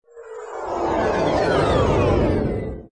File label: Exit Warp; Star Trek Adversaries